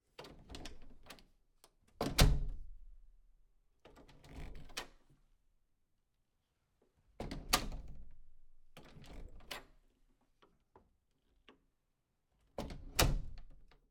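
interior house door bedroom wood open close close medium; Sonniss GDC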